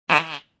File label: Fart; Farting sound; Human